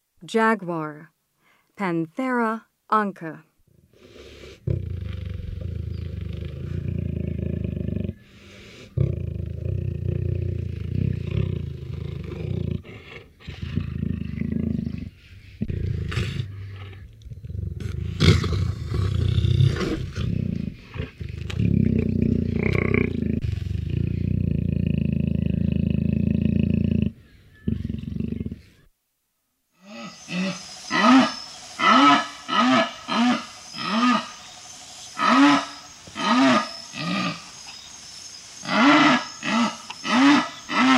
Jaguar, Mammals of the Rainforest; S. America

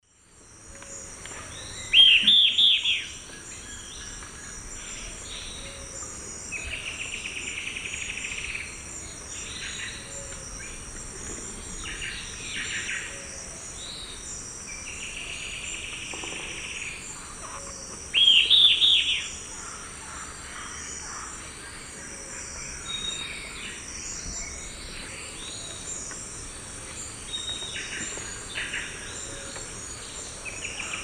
Myiopagis flavivertex, call; S. America; Eastern Lowlands

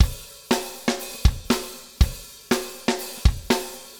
Funk Rock Beat, Drums

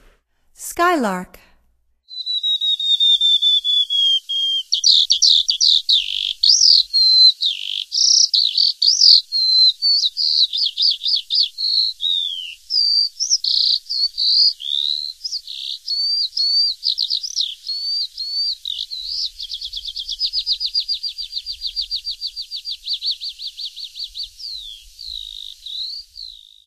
Sky Lark, Songs of Birds of North America; Stokes

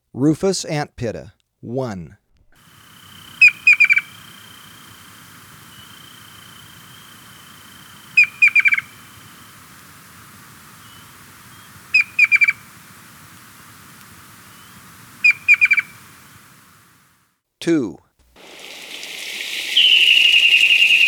Rufous Antpitta; S. America

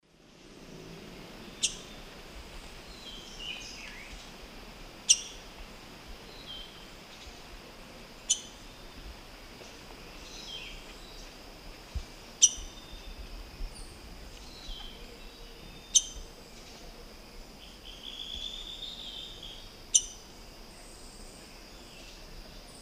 Pheucticus ludovicianus, call; S. America; Eastern Lowlands

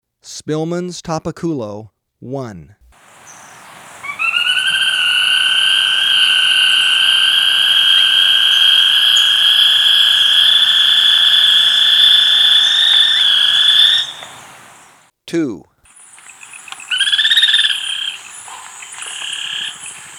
Spillmann's Tapaculo, Birds of Ecuador; S. America

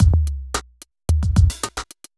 evil beats 110

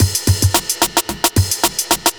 block rock kit

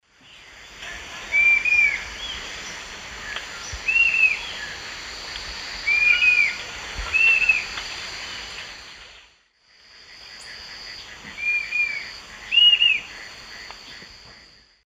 Tyrannulus elatus, songs 2 birds; S. America; Eastern Lowlands

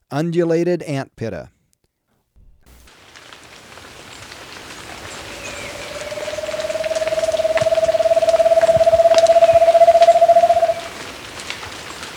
Undulated Antpitta, Birds of Ecuador; S. America

